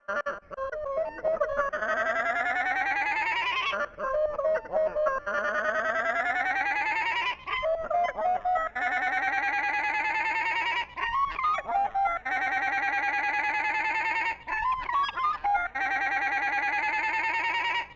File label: Sound Design Seagull Racing Car